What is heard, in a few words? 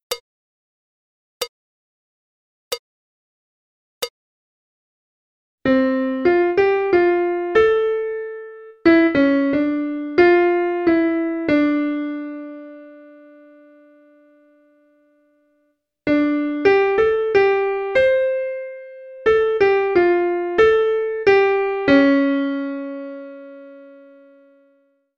Bossa Nova; Jazz Harmonia